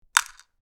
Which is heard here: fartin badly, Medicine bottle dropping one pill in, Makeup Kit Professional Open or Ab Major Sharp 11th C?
Medicine bottle dropping one pill in